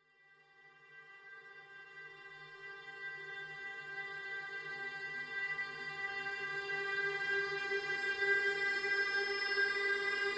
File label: vln FX